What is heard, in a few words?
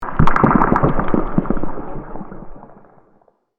shattering ice